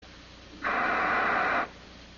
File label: Breath, voder